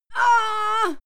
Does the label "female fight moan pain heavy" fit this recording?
Yes